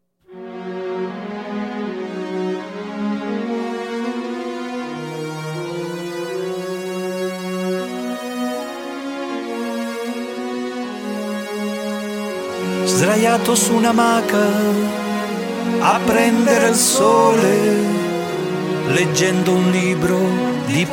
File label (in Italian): Medievale